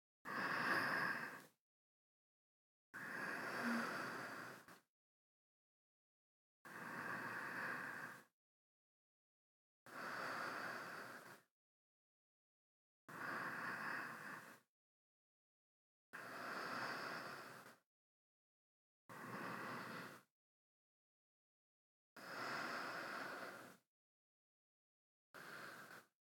寝息, ASMR; 天使の時間

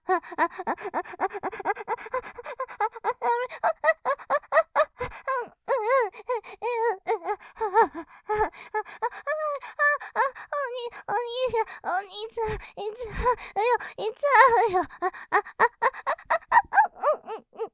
喘ぎ 絶頂スパート いっちゃうよぉ；；いっちゃうよぉ！; モノラル; ループで楽しむ！シェフの気まぐれ抜き抜きコース

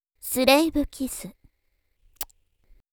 スレイブキス…チュッ…; 魅惑の美少女妖魔ロックブーケ

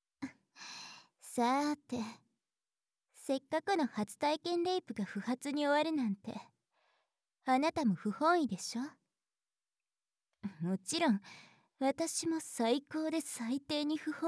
フェラチオ

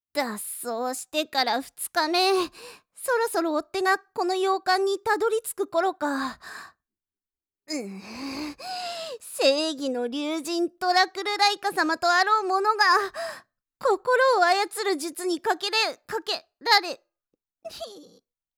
泣く; ライカ様; 序章

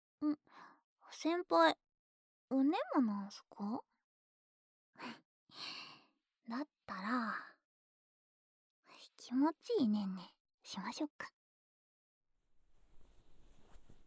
安眠・ヘッドマッサージ, ASMR

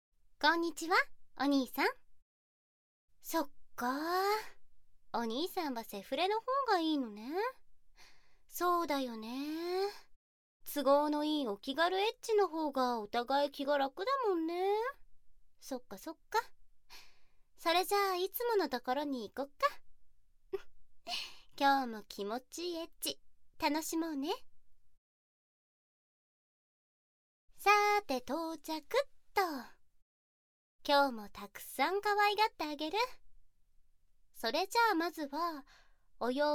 効果音なし, 耳舐め; セフレルート、エッチだけの関係; バックから逆アナル・手コキ・耳舐め・乳首責め・逆フェラ・逆お掃除フェラ

